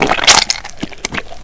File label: squish